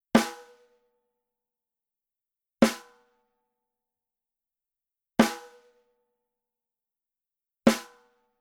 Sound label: SNARE Center Hard